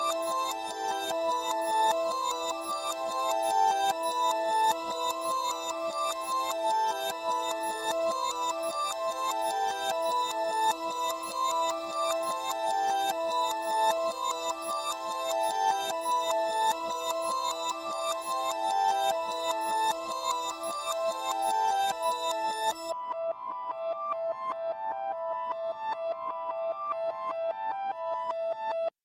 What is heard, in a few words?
tubular bells backwards